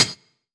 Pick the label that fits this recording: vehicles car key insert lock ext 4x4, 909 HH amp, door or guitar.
909 HH amp